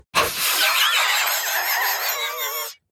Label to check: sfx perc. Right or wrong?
Wrong